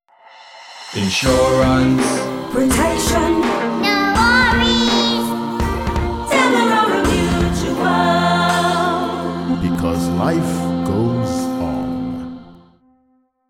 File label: Life Goes On Jingle